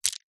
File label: household necklace small stones pebbles catch